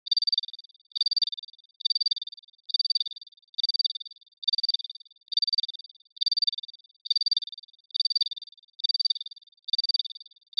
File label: Alarm Beep Pulses